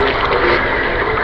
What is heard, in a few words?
WATERY PUM; MACHINES